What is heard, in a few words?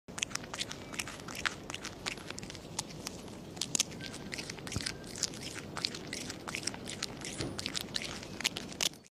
quokka; کوئوکا